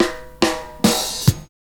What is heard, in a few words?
FILLS, 70_s Breakbeats